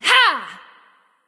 grunt attack; smite; nuwa

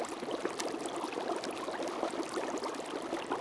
flametrail loop; Smite; Sol

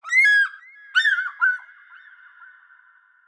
ambience good bird; env s8conquest; smite

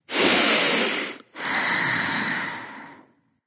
nv breath; smite; awilix

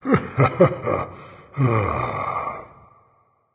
content, voice; Smite; ymir skin6

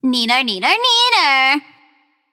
tongue, voicepack; smite; pele

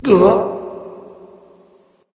nv confused; Smite; awilix

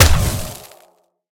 hit; smite; olorun

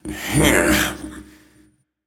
grunt lift; smite; kuzenbo

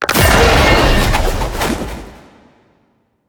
activate; Smite; god Kuzenbo v2

